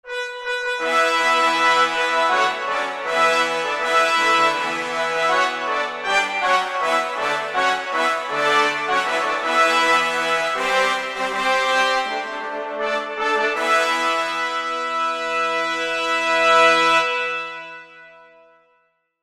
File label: Fanfare